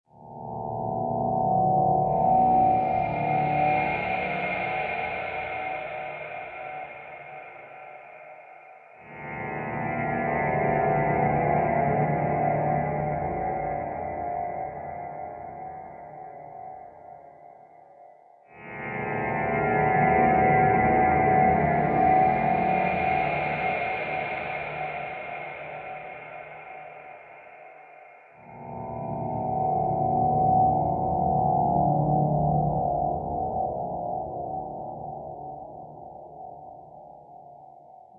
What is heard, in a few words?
Ambience; Mace Griffin Bounty Hunter; XBOX